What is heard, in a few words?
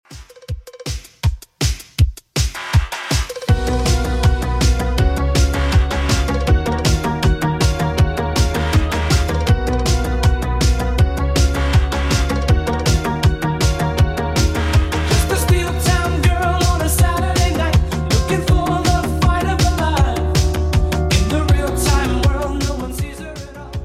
80s Redrum, 160 bpm